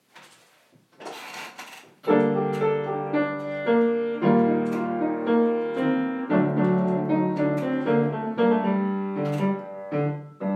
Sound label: trumpet